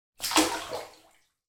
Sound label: household bath object drop in bath water splash